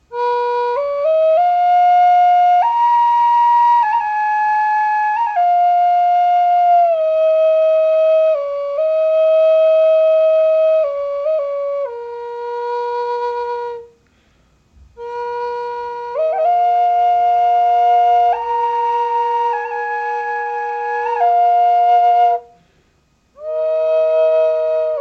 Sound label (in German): High B 432 ohne, drone flute indianerfloete doppelfloete b 432hz nuss; nussbaum